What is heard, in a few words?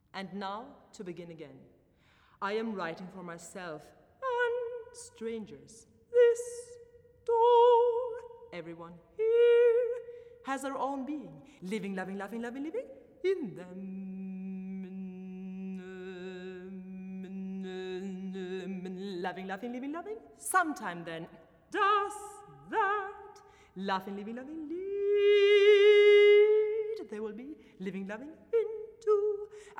Talking Singing